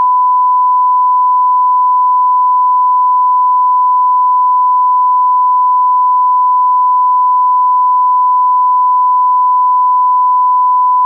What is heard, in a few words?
sine 1khz mono